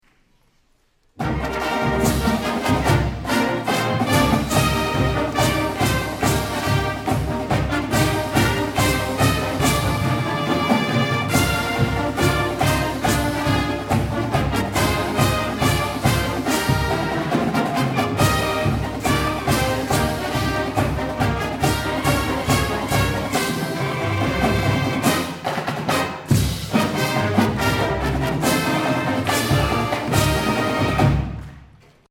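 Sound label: victory, Marching Band; gvsu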